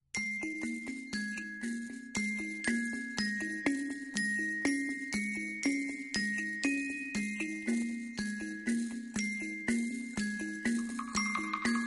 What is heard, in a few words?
Piano